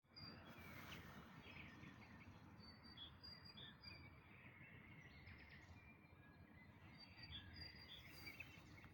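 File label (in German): Dawn Chorus, Vogelgesänge vor Sonnenaufgang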